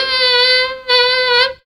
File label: weepin sax; Zero-G; Funky Elements